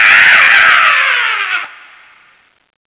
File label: light death, zombie; Zombie Darkness